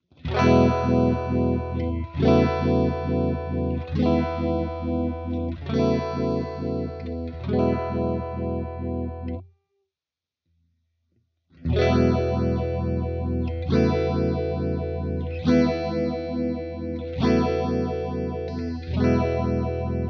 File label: phaser